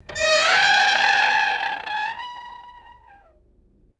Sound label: comic squeaking stretch with echoing decay; vegetation